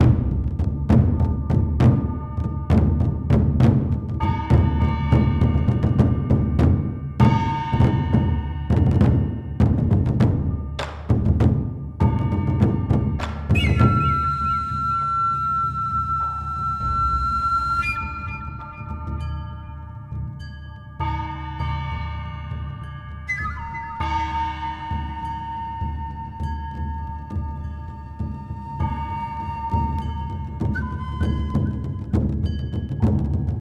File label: Music Boss (Boss Fight Intensity=Strong); Music Boss Hideout1; BossFight Phase=Phase2